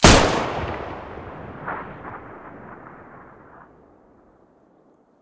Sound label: toz34 hole mono, weapons